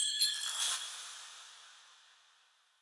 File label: Mote Chatter Bell First; Motes